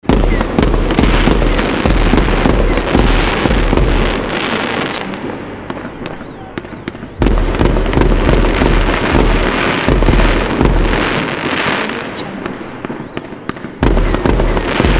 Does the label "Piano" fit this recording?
No